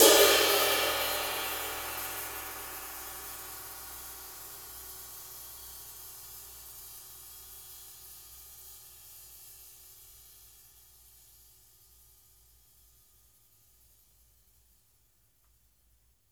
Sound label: SIZZ CRSH, cymbals; Sampleheads - New York City Drumworks VOL-1; SW CYMBALS